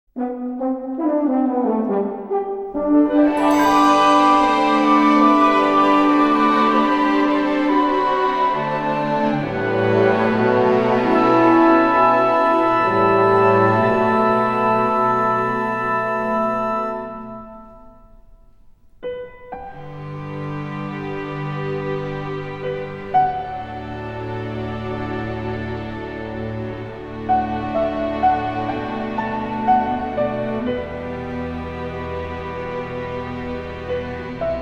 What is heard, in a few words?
pavan for piano and orchestra